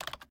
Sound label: Key Spray; Game rip; MiSide